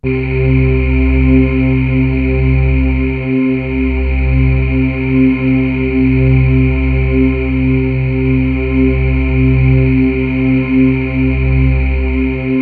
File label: VOX PIAN; MORPHEUS